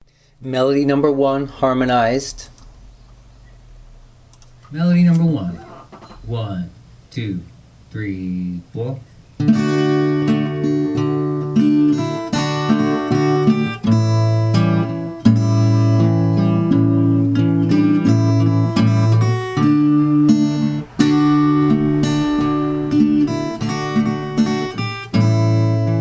melody harmonized

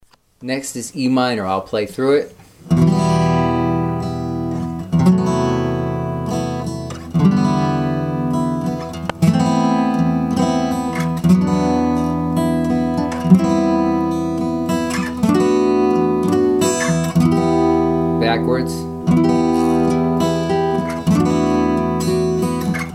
open string chord hybrids Em

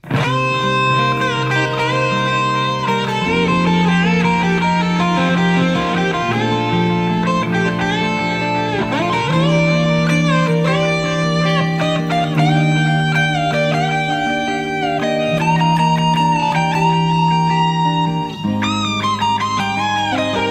solo with only rhythm guitar

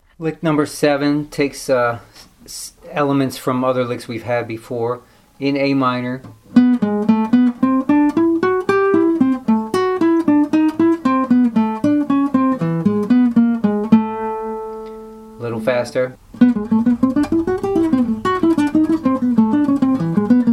jazz licks

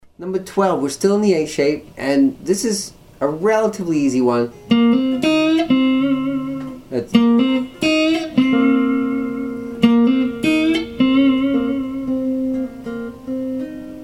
string bend lick

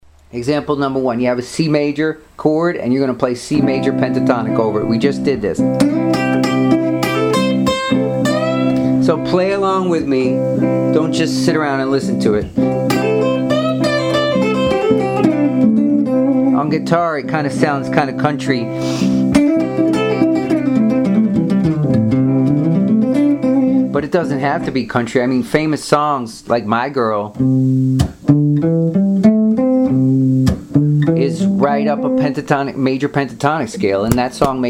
advanced minor pentatonics

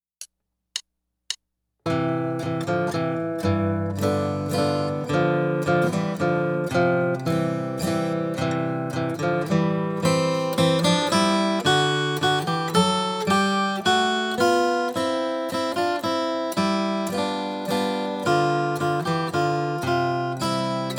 Duet

